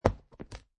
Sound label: Cards Playing Cards Dealing On Felt; Playing cards, poker, dealing cards on felt. Dealer taking cards from hand and putting on felt.